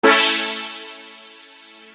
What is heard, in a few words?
Quick Pad; Construction Kit; HIP-HOP; Sonic Foundry (Sony Creative Software)